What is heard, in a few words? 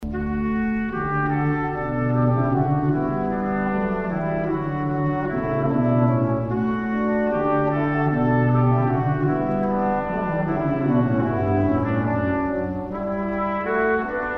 joyful joyful we adore thee, brass